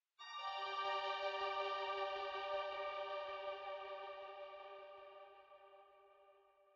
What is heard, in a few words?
Success Stinger; Forest SFX